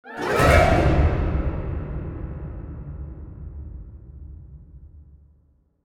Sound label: Stinger; the walking dead survival instinct gamerip